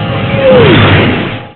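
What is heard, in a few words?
near miss, military; Easy Personal Web Page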